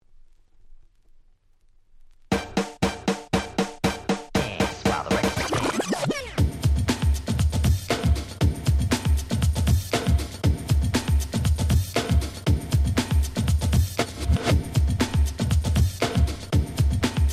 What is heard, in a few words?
funky clap club